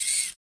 gunslinger inspect finger move, weapons

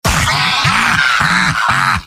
laugh happy; heavy; video games; source engine; tf; mvm

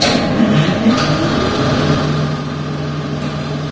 machine; ambient; Source Engine; video game

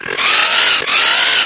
antlion pre burst scream; npc; source engine; ep2

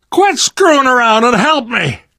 dying, survivor voice; source engine; left 4 dead 2; namvet

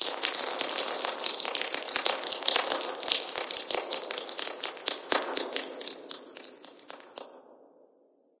random drips

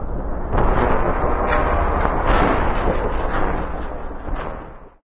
strange talk; ambient; source engine; hl2; citadel

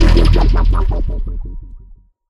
player bounce jump paint

